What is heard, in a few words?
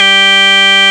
CLICKY G3, CLICKY ORGAN